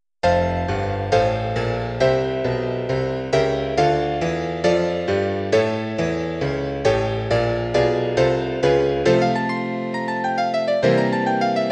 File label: Notturno; Spielbuch; Lorenz